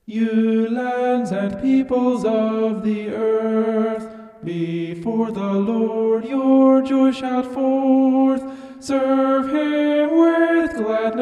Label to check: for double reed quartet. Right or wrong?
Wrong